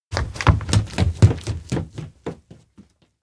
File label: steps indoor janitor room burst out; anemoi パッケージ版 1.1